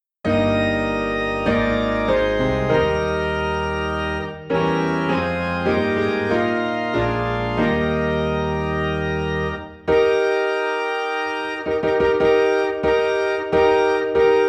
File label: Piano and Organ in E Flat; God of our Fathers